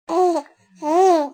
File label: baby laugh